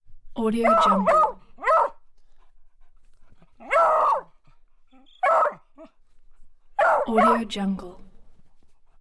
small dog barks with squeaky howls; nature sounds

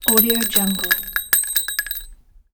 rounds metal drop onto smooth tile floor; game sounds

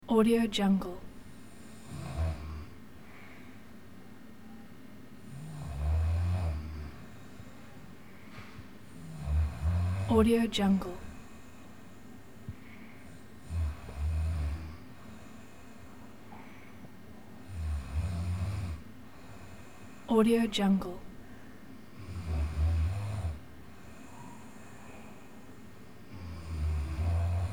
hostel dormitory night people sleep snores; urban sounds

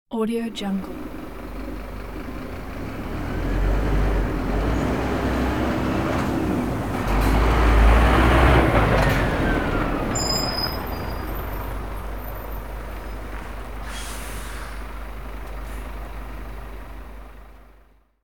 garbage truck moving; urban sounds

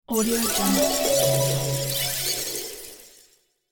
butterflies bunch spell; game sounds

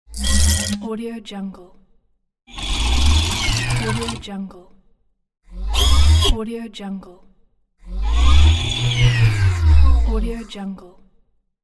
security turret droid; futuristic sounds

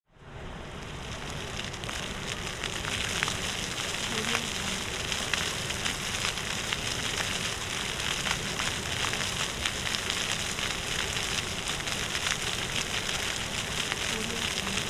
dry deck fountain in courtyard; urban sounds

